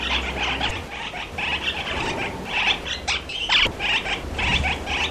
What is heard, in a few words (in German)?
unruhe am morgen, Katharinasittiche, ein aufgeregtes Geplapper; Auch vor dem ersten Flug am Morgen ist der Schwarm aufgeregt und unruhig.